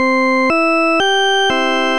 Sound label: organ